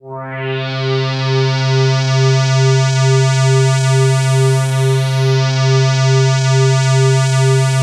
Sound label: PAD C3 L, Jungle; USB Soundscan vol.05 - Explosive Jungle; AKAI